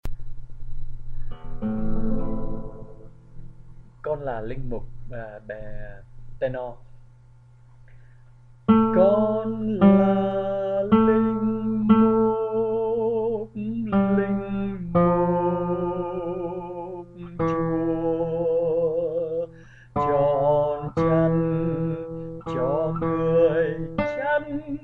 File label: Tenor